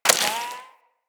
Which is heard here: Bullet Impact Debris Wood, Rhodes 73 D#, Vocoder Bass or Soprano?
Bullet Impact Debris Wood